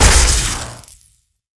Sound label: Plasmagun Skin, Damage